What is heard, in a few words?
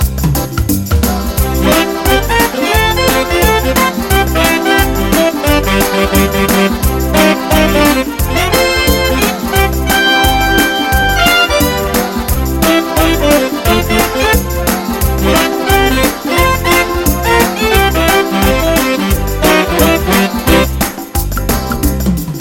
Pretty Mambo